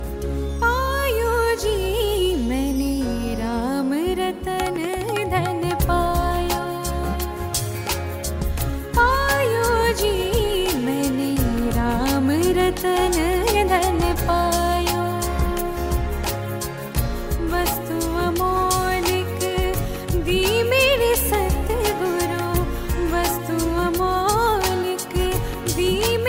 bhajan

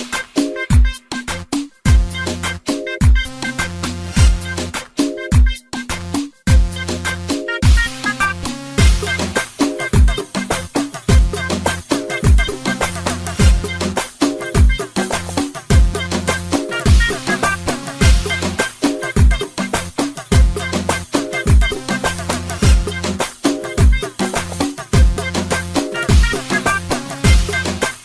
arabic ringtone; iphone 12 pro max

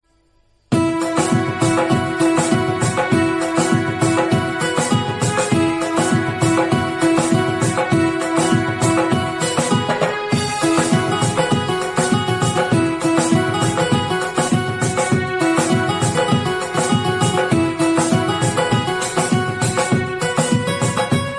diwali instrumental ringtone

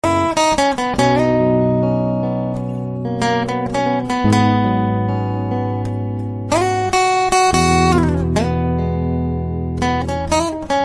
guitar ringtone

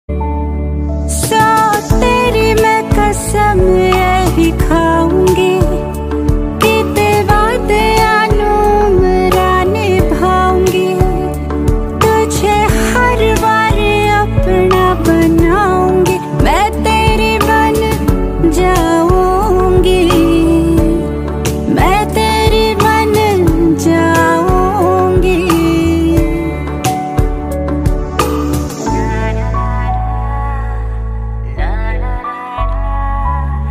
acoustic version ringtone

